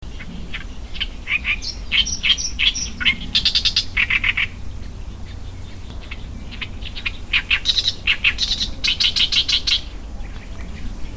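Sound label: great reed warbler; ooyoshikiri; kahokugat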